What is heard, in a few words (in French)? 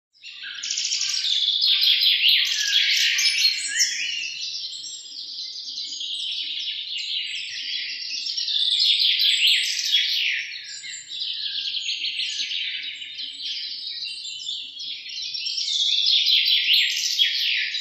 Oiseaux Chantant